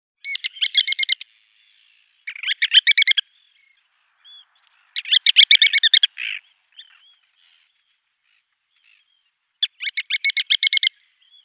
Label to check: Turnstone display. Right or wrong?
Right